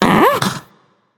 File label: surprised, voicepack; smite; jorm